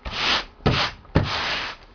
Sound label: household